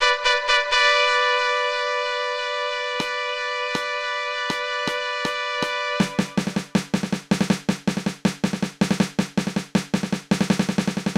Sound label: marching band hip hop interlude